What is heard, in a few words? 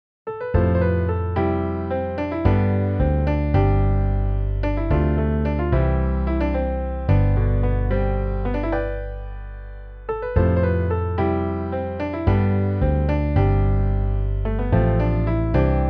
Piano Bass